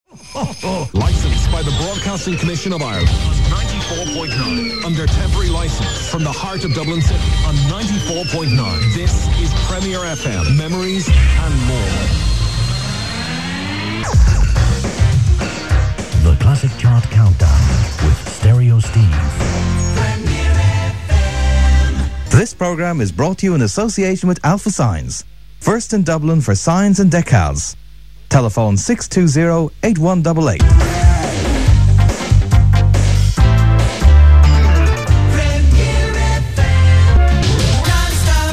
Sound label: jingles; premier fm 94.9